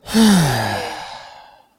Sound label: Sighing, Bored Or Relief, Male Relieved Sigh